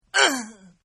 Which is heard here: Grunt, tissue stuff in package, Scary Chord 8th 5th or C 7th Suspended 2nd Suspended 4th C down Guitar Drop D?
Grunt